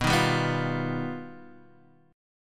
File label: G Augmented 7th